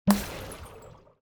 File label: projectile; necrochemist; Shelter